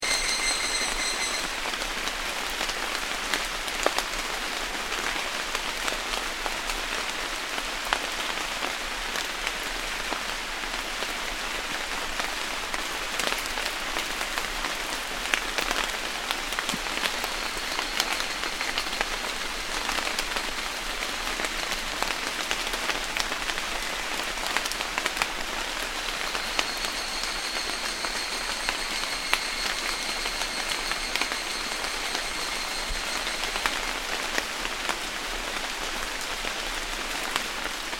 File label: Ambience Sannohe Summer Rain Insects, Storms in Sannohe Japan; Outdoor Sound Effects; Outdoors (Sound Effects:Backgrounds); Foundation1